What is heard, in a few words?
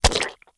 GORE Stab Splat Only Bubbles; mono; GORE & SPLATS; Universal Sound FX